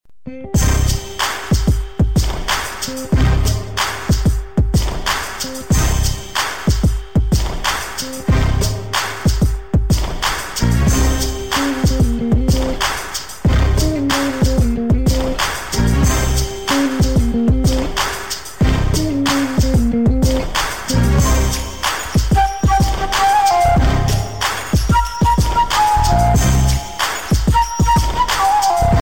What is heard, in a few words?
arabic beat